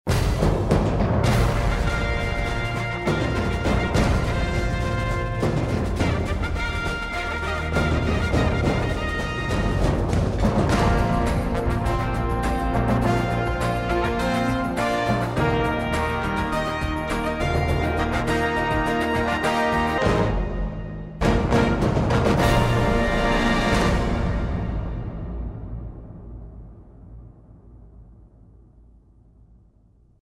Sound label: Battle